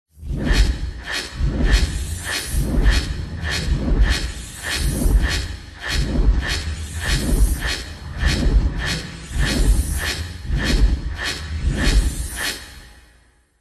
robotic power surges sci fi robotic robot cyber futuristic energy machine drone